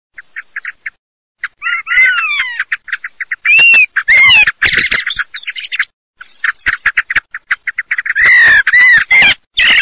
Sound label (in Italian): MERLO, birdsound